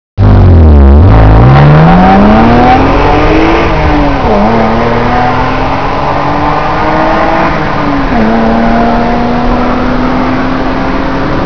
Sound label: ferrari F40